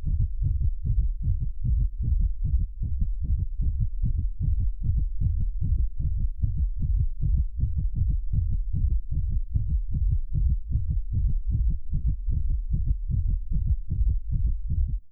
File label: Heartbeat Fast; Vocals & Wallas